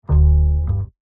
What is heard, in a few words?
Bass, Jazz for piano